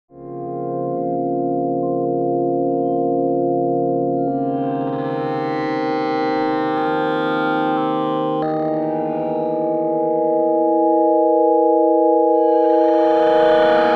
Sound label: Pad